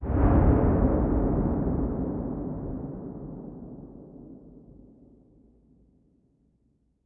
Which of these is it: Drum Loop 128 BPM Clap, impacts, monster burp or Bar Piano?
impacts